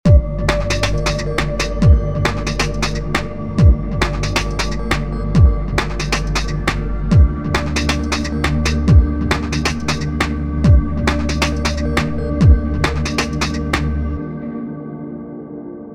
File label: 2 beats